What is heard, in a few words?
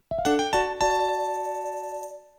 Banner Jingle; animal crossing happy home designer